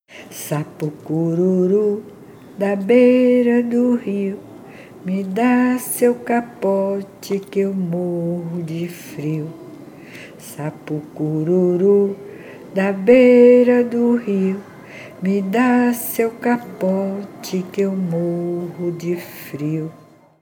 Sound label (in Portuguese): Sapo Cururu Cantiga de ninar